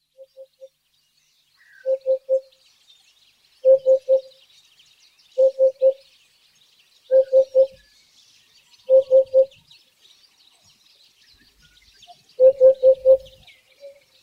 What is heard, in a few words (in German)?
Wiedehopf